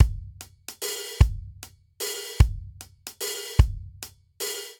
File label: Swing Waltz Intro 3/4 150 bpm, Drum; MIDI Clips; Ableton; Drum Booth